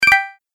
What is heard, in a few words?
Bonus Collect, video game sound effects